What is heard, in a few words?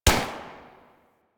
Fire Shot; Warframe; Tenno AR